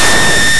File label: beam